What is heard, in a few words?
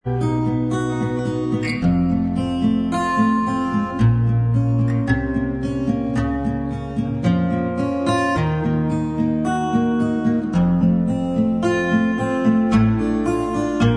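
acoustic version instrumental track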